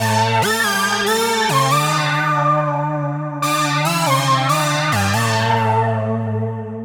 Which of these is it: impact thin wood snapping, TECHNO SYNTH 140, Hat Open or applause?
TECHNO SYNTH 140